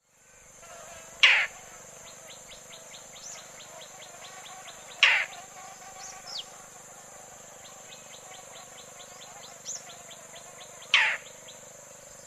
Rollers; سبز قبا

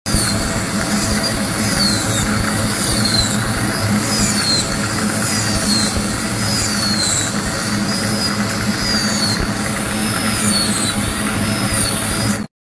Dental drill; مته دندانپزشکی

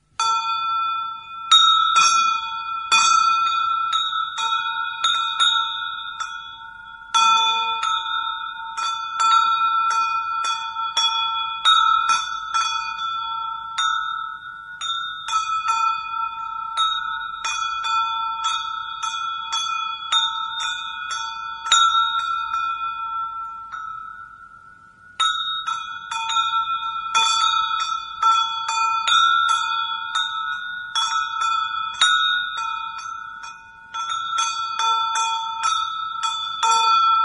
Camel bells ringing

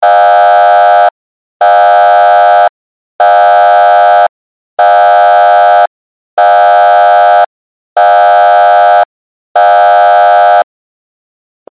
sound earthquake maneuver

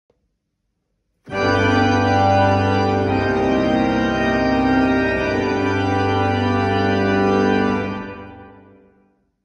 organ instrument

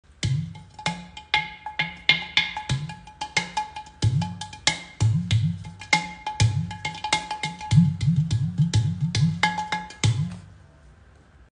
kim kim; کوزه

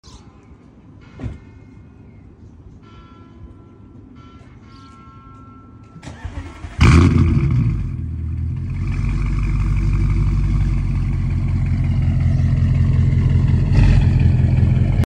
Audi exhaust

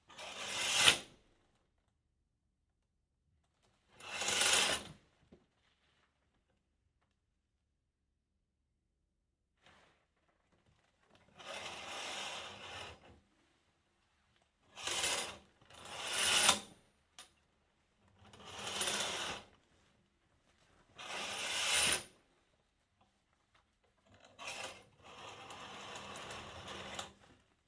Curtain, کشیدن پرده

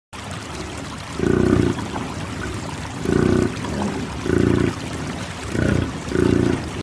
Domestic water buffalo

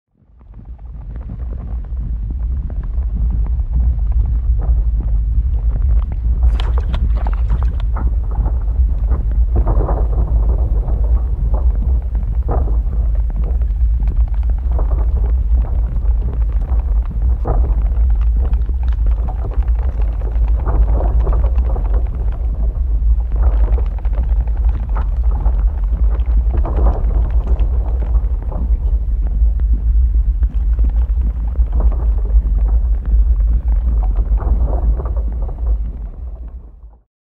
magma

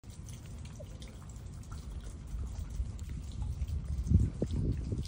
Earthworm; کرم خاکی

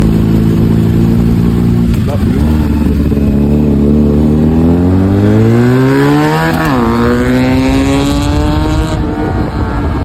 Benelli exhaust

